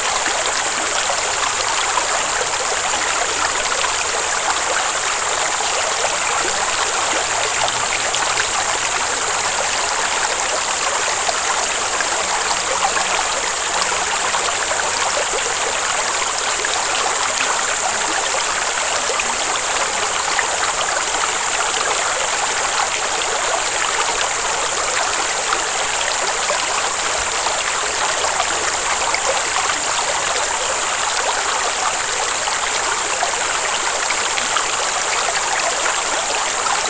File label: meadow creek